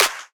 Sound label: Trap Clap; Drums; One Shots; Claps; Cymatics; EDM Starter Pack